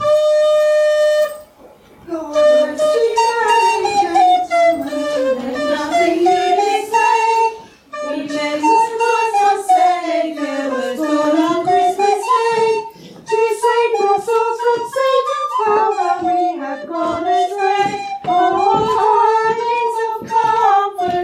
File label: carol singers